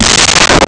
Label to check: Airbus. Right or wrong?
Wrong